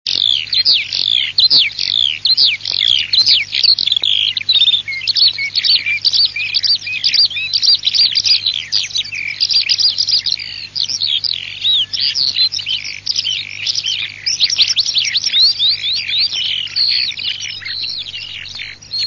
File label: ALLODOLA LARK ALOUETTE LERCHE; Canti degli uccelli